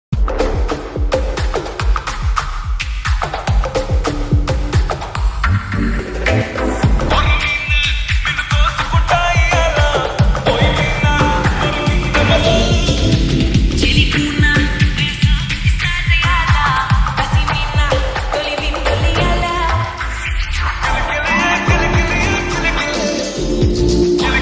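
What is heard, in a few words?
epic bgm ringtone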